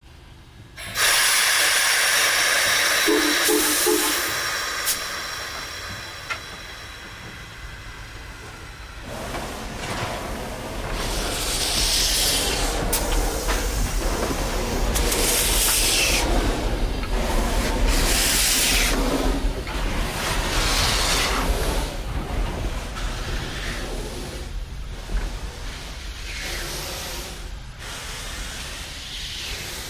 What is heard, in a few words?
Train Whistle Blows, Steam Release, Hiss And Blasts, Train Moving Slow. Echoey And Away. Close To Distant Fade Out With Distant Chugging.